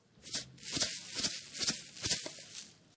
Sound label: Salt Shaker Shake Salt Sprinkle Salt; Food Sound Effects; Household; Lynne Music